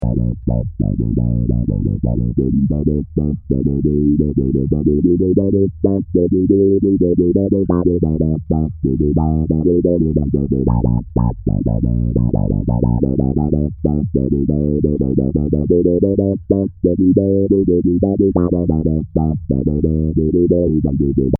a filter

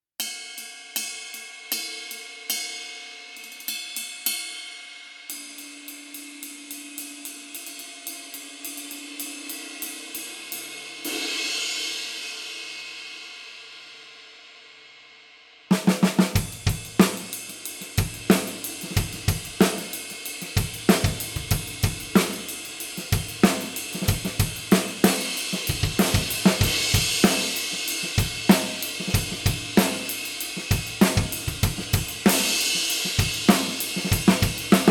22 Brilliant Heavy Ride

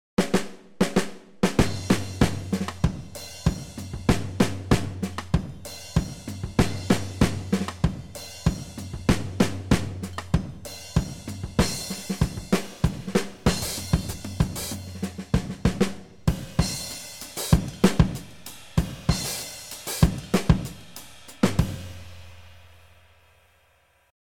60s Late Full Vintage

